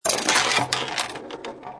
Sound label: launch ball